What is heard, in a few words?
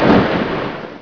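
thunder shot; mk2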